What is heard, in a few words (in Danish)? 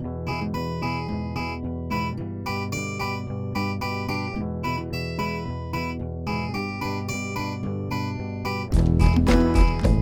Xyllefon